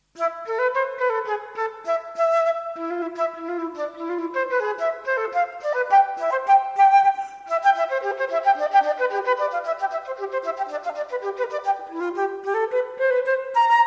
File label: Flute solo sonata; BWV1013; Bach